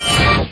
Portal Close; fireboy and watergirl

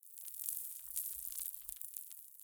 Dust Falling; adams venture origins

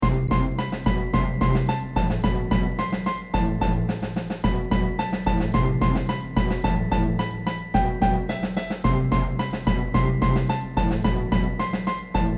Orchestra

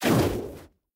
Fiery End; Devour

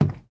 Wood soft

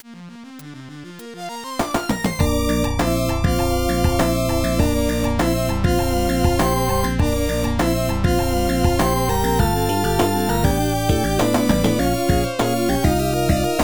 Victory Theme, genesis; virtua racing

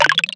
Upgrade; Gonner

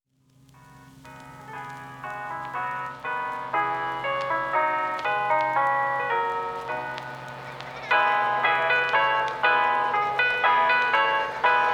The Harmonious Blacksmith; bayonetta 2; Angelic Hymns Gold LP

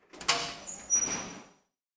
prison door open; adams venture origins; Kismet

